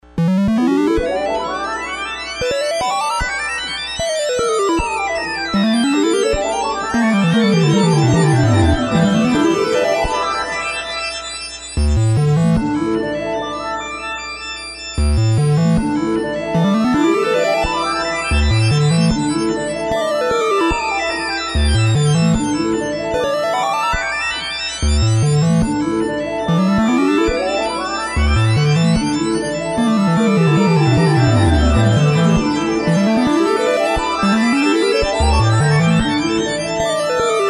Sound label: ARDUINO KEYBOARD SCALES